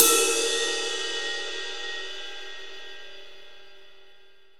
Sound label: ride, Percussion; realdrum